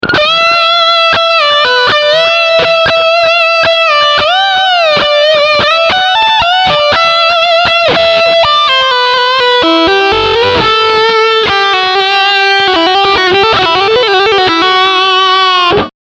TriAmp

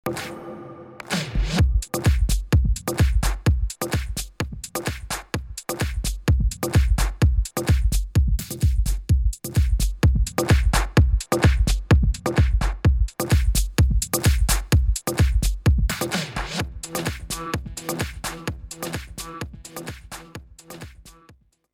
Xone 22 EQ

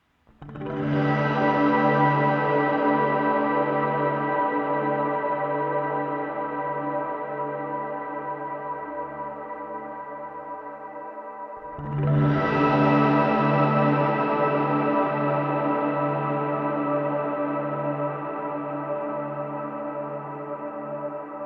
Shimmer Reverb Reverse Delay; Source Audio Collider

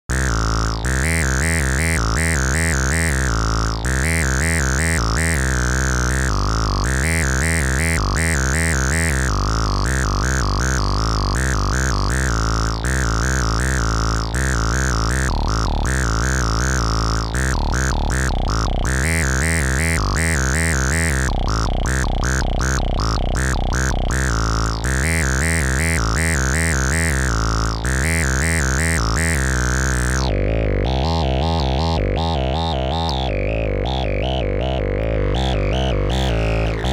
Beepstreet Dagger Glidebass

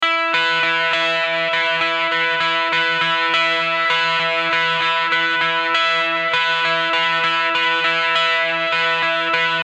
Helios III EQ

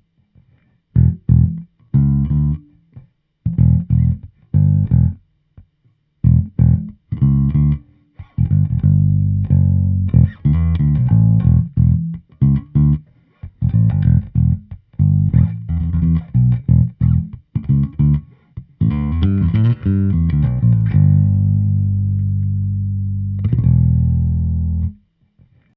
fet 47 Bass Amp 40 cm; united UT

